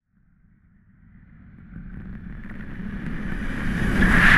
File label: Swell Ghostly; bottlerocket